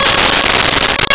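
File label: Steel String Guitar Db6; Nintendo; July 2020 Gigaleak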